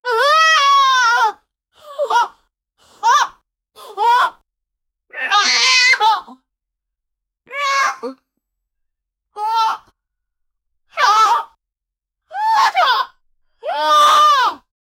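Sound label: cartoonish family reaction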